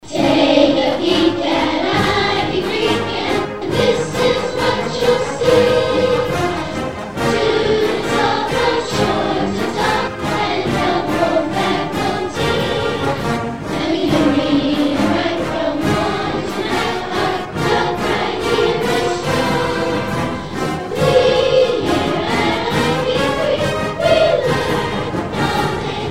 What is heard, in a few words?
school song; Ivy Creek School Song